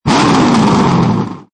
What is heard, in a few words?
Rev Short; disney; cars; Lightning